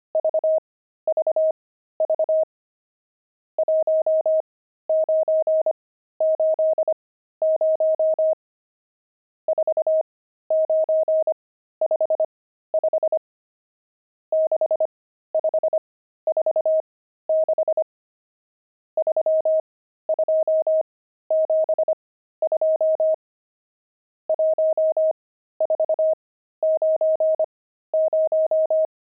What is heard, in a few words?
pangram, intermediate pangrams files